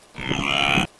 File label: Saiga tatarica